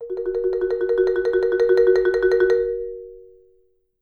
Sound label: Marimba roll A#3; Sampleheads - Dave Samuels Marimba & Vibes